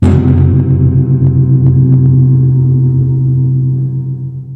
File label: Gong